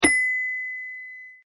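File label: xylophone; Toy Story 3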